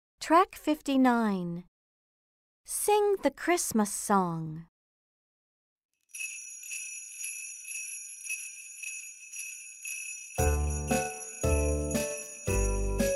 slow

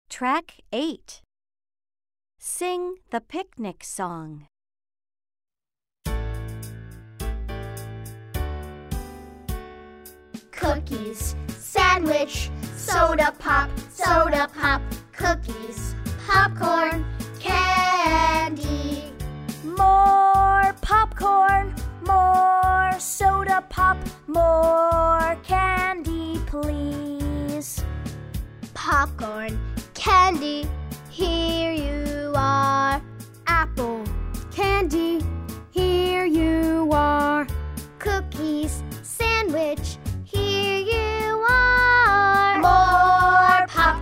Sing the picnic song

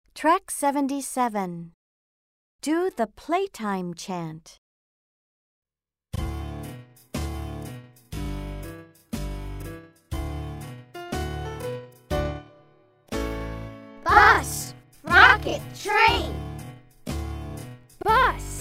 the playtime chant slow

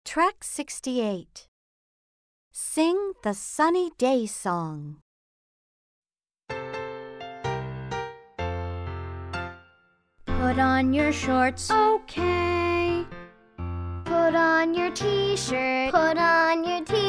the sunny day song

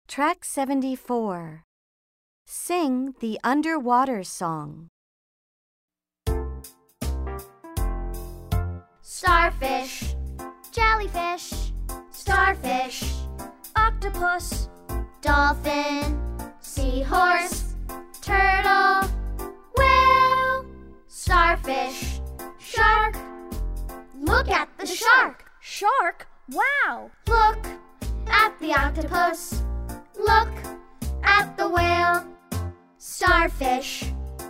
the underwater song

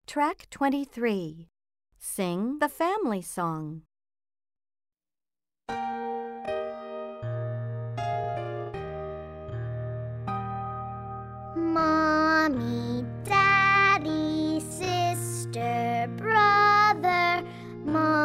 Sing the family song slow

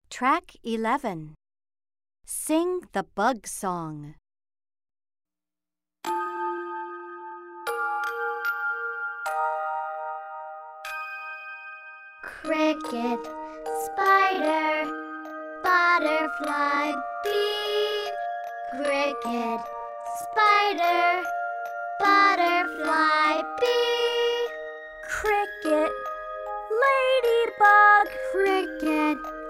Sing the Bug song slow

